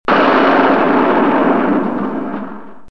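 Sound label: explosion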